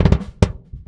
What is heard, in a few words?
Metal Doublekick Clik; Airwindows